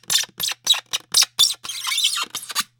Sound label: Squeak Creak Glass Styrofoam